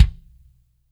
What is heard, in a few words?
DRY, DRY+FAT KIT